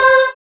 Synths Root C

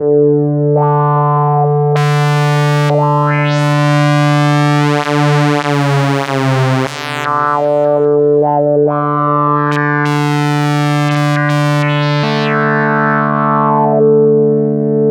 Fresh Flutey, Synths; Sonic Foundry (Sony Creative Software); Modular Electronica

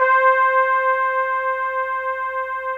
BRASS C4, ORCH BRASS; Greytsounds VOL-1; ORCH BRASS 2

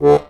DIATON BAS, AKKORDEON, Alpine Volksmusik

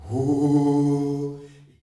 soft, male; Spectrasonics; Heart of Africa 2; S.MALEAMB

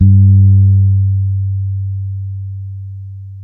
G 3, ragga, bass; Spectrasonics; Bass Legends CD 1

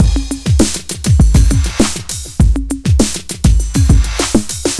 sweepstake, 100bpm

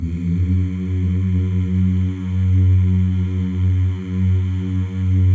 male; Spectrasonics; Symphony of Voices

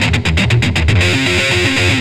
Riff, Industrial; Nasty

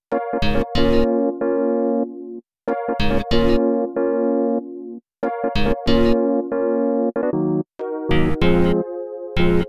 Melody, Hip-Hop

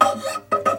SQUEEK, Nylon Guitar

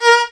VIOL B3, VIOLON, Arabian Traditions; USB Soundscan vol.43; AKAI

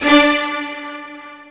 Horse Hair D, Strings, Drum & Bass; 04 HorseHair 160; Total Drum&Bass

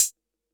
808 HH Combo; Concrete Collage Drum Kit; Concrete-808

